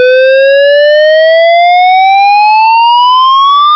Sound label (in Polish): syrena